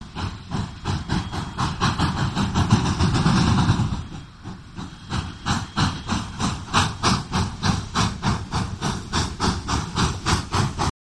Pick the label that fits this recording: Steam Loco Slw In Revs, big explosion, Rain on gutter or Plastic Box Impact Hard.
Steam Loco Slw In Revs